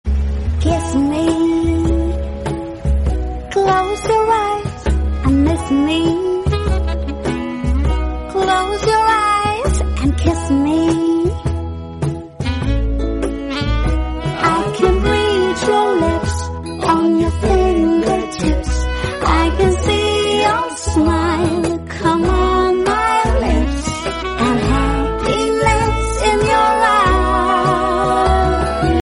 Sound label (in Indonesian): cium aku nada dering